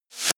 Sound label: reverse hit electrocuted